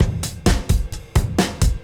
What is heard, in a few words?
Beat Style A 130, breaks; 130bpm